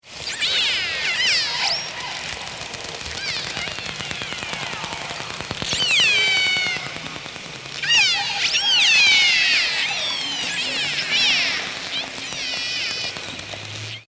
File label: orca; scripps